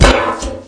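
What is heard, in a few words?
grass; Mafie 1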